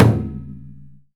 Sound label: DAIKOKU, JAPANESE PERCUSSION; AKAI S6000 CD-ROM - Volume 5; Ethic